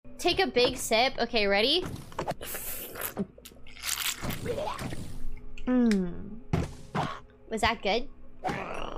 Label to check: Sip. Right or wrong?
Right